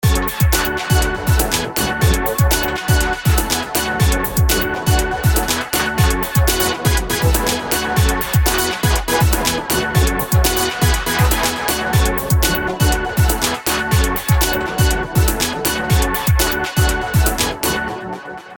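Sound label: melody molecular level wet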